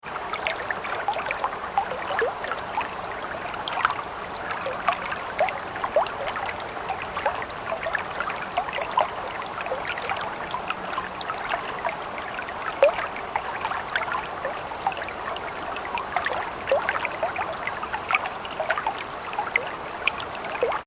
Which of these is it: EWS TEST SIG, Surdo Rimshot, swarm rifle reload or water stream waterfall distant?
water stream waterfall distant